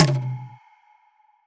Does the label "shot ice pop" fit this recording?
No